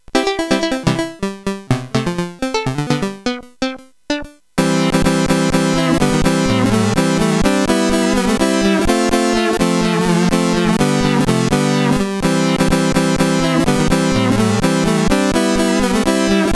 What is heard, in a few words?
CSG8580; Amorphous